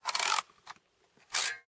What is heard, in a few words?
breda30 clip, Weapons Guns Interact